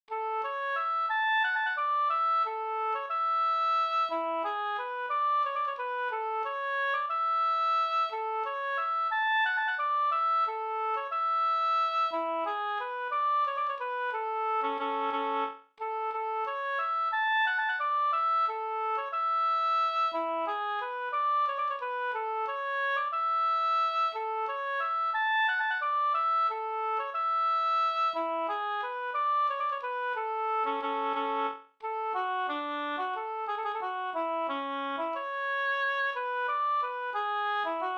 vals A dur

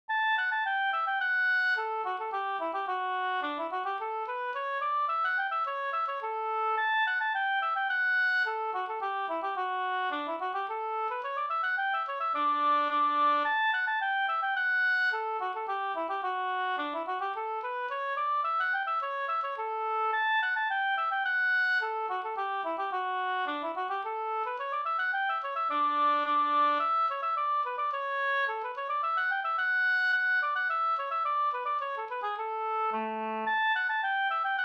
slängpolska

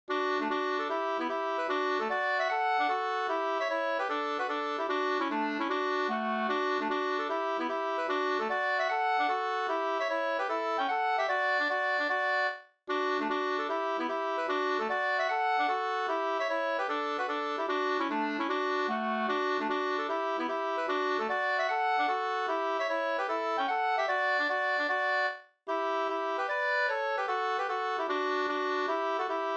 schottis D dur; e Bror Strand Urshult Sm 2st

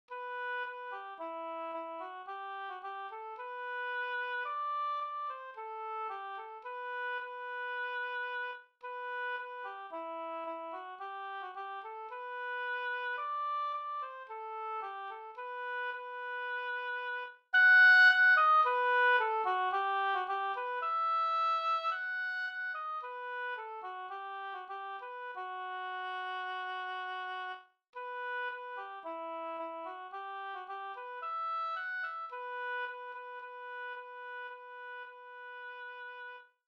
vismelodi Folkvisa

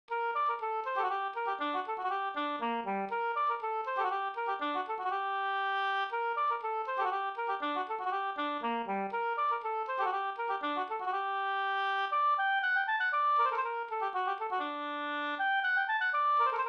polska Bingsjopolska Karbolepolskan

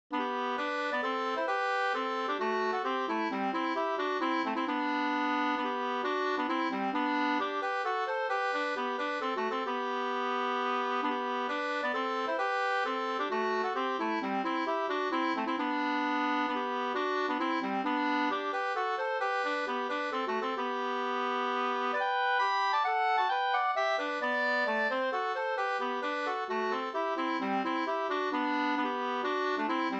polska kringellek; Gagnef

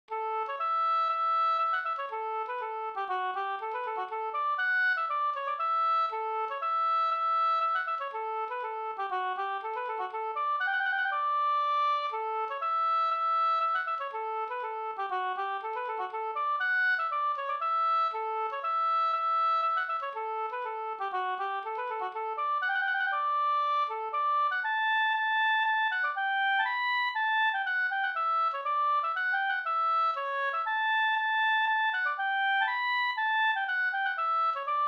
polska Bingsjopolska; e Slunk Jerk Nr1 utl av Bosse Larsson

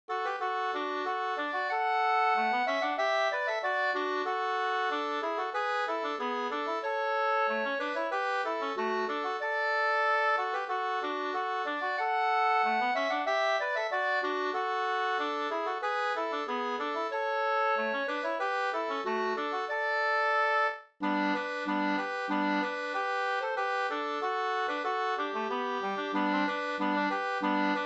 polka polkett; e Emil Hansson Boden Nb 2st

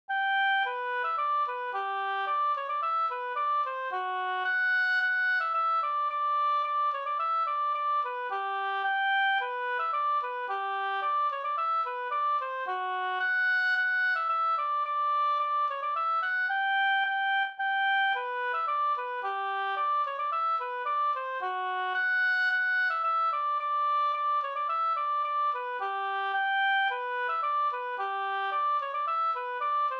snoa

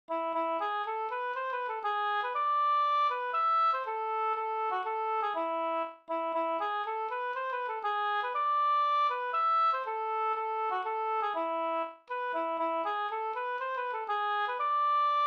polska vispolska

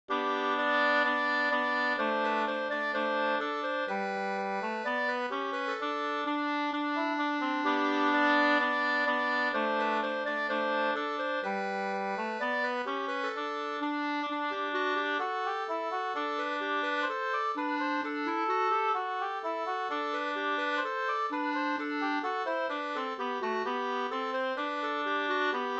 skanklat

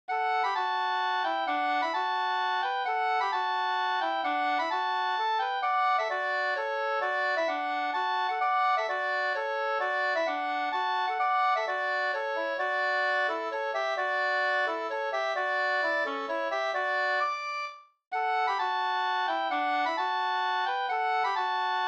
folkdans Fiskardansen; Bh Ahlstrom219 2st

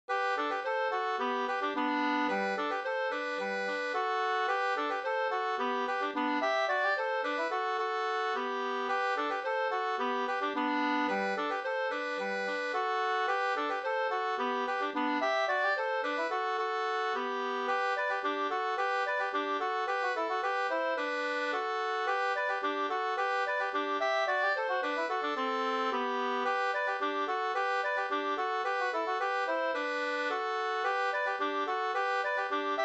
folkdans; Treparsdans; Sodertorn Sd; Dybeck

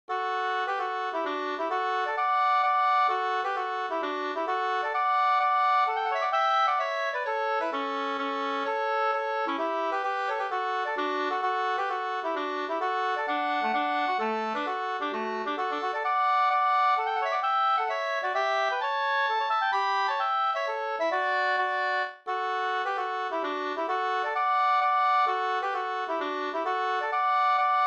hambopolska

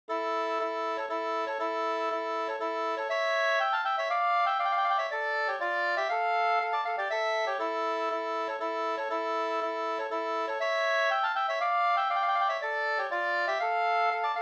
polska brudpolska Alvdalen

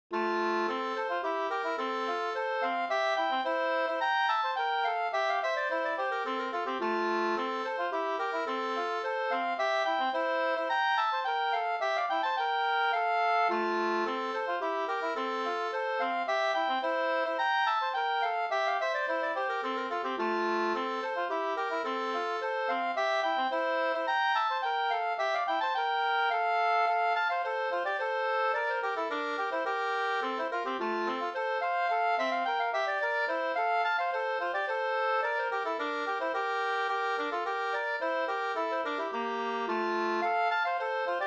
slangpolska 2st

